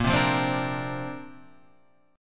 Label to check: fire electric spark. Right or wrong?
Wrong